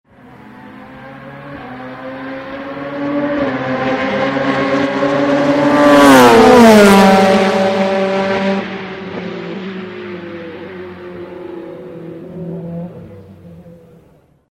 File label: DTM, slotracing